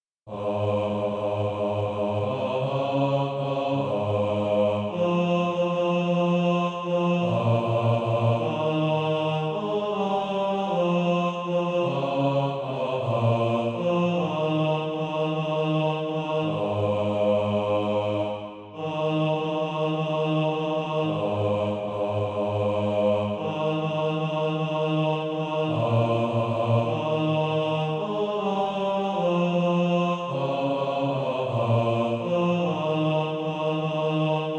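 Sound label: bass, Practise Music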